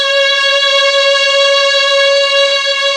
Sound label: Mellotron C#4, STRING; Best Service Gigapack 1